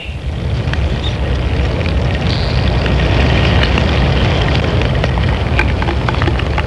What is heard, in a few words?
noise